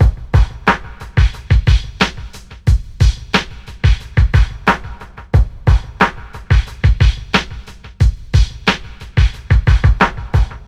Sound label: Spotted, chillout